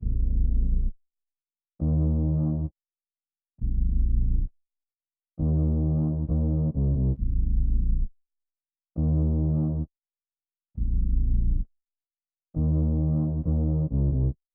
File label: 134 BPM A# Min Bass; Cymatics - 2022 Melody USB Expansion; Tenebris